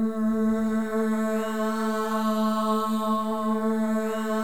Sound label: MOVING V2, Symphony of Voices; Spectrasonics